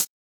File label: Closed Hat; Ghosthack Advent Calendar 2017 Day 5